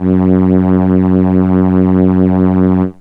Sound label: Solina Contrabass F#2; Analog Synths; Ableton Foundation FreePacks